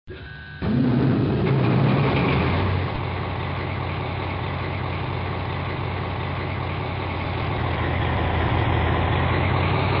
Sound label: diesel speed